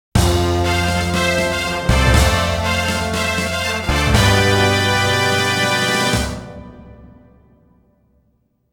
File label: Section Prize Fanfare; Tanjou S Saturn Version Original Soundtrack Perfect Collection